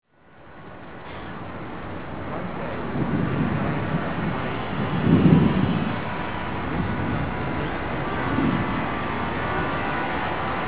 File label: tune